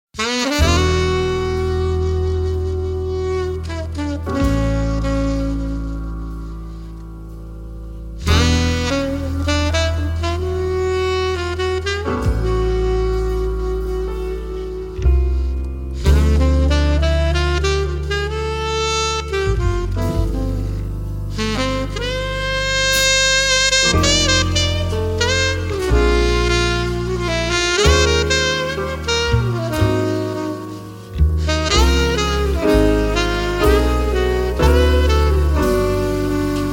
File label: Instrumental